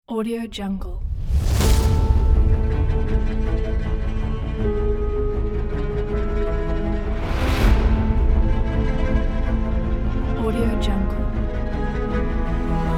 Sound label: blockbuster trailer